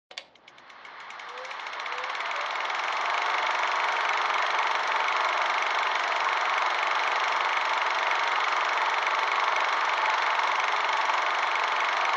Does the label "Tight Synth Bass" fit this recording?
No